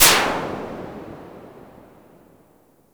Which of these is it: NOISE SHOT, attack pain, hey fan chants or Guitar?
NOISE SHOT